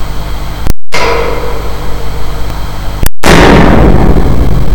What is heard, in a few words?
Beam On TED COLL